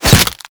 Barb Hit Default; Warframe; Desert Skate; Hit Effects

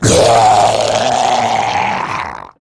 Death, Dialog Barks Battle; Warframe; Grineer Ghoul Devourer

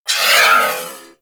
Missile Tail; Warframe; Weapons; Archwing

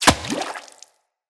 Bow Arrow Impact Water; Weapon Impacts; Player; Bows; Environment; Hunter; Envoy Hunter Bow

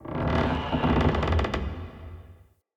Cave Mine Wood Beam Creak

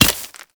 Ghoul Expired Grenade Hit Dirt; Warframe; Grineer Ghouls

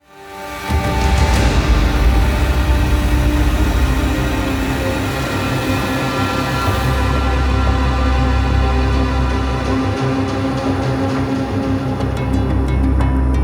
Login Music; Login Screen; Deimos

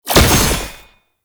Fist Claw Spike Despawn; Weapons; Warframe; Tenno; Incarnon

